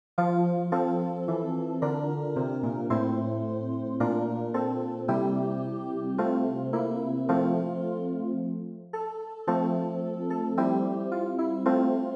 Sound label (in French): CLAVIER; Psaume127